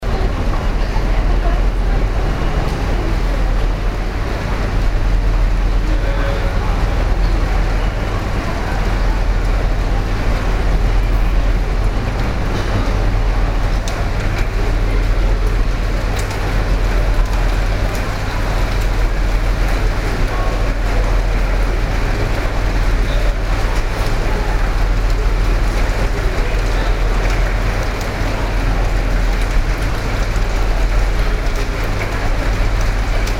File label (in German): zugfahrt